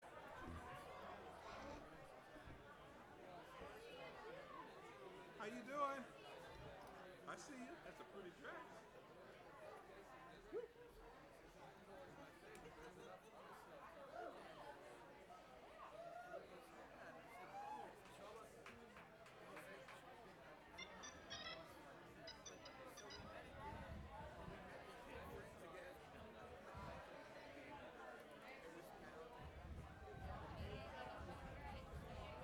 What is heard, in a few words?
tuning